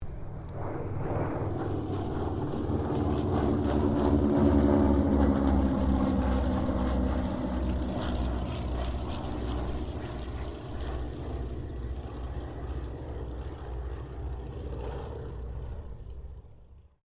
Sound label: C195 squadron 2 pass, Airplane; Mixed aircraft squadrons